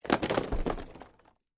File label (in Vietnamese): Tieng mot dong hop nho do dac roi lop cop